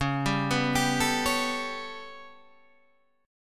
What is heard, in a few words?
B 7th Suspended 2nd Sharp 5th C sharp